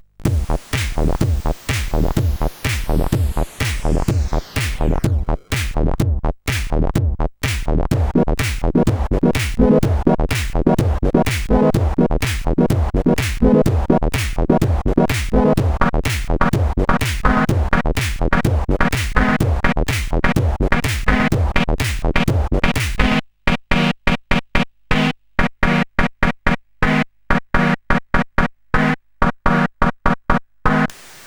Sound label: sid, CSG8580; hvsc